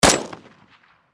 Gun Rifle, 7.62 Caliber Dragunov Svd Bolt Action Single Shot; Distant Perspective; Warfare; Blastwave